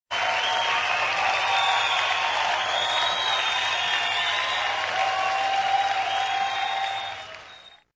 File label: Applause